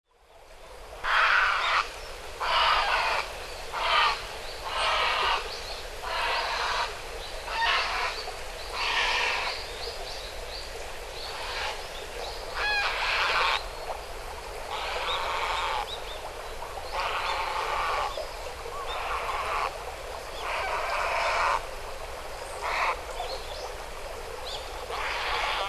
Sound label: aosagi; hyogo; koe